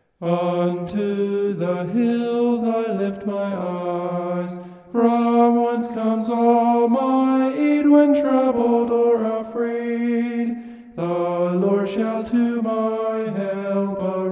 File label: vocal homoph; psalm 121